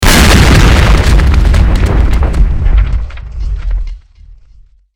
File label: timer Bomb; minesweeper